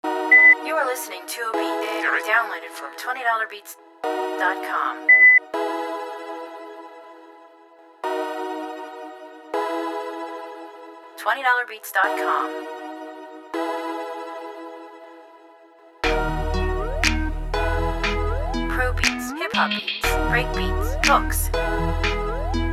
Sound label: Birds, Dirty South beats